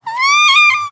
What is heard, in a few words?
Kitten Meow; SES Animals SFX